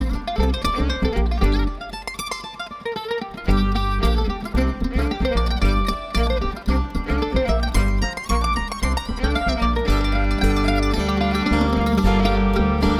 Kentucky Mandolin

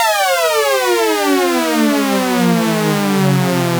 Balloon, Tech House; Tech House Collection

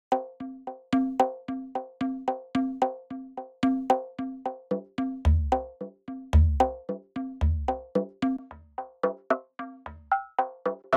ARPS Perc Slow Driver

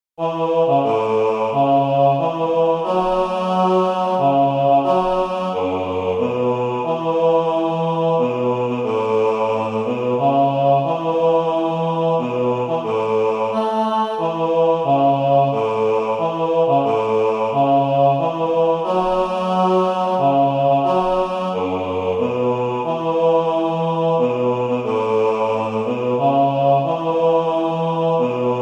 Star Spangled Banner Bass; Rehearsal Tracks

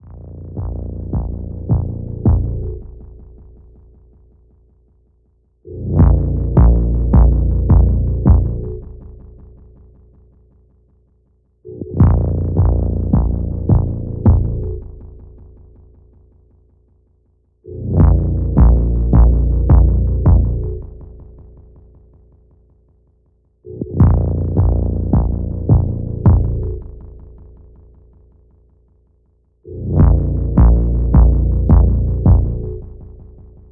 HipHop Kit; UBEAT

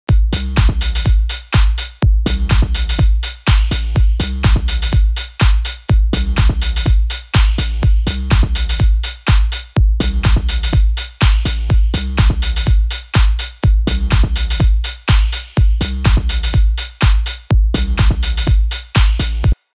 Elektro Kit; UBEAT

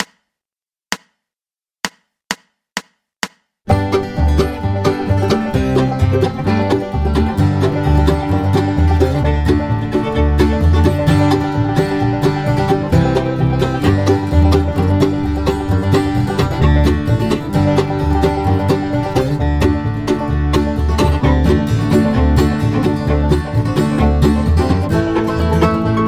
Chimes (G)

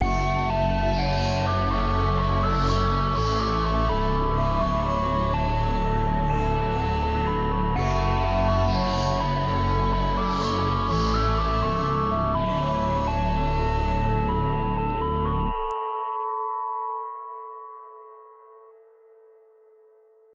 atmosphere; MineSweepa; Unison

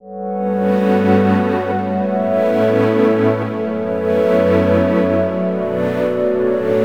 Pad; Chillout Collection

